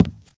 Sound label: wood, footsteps, walk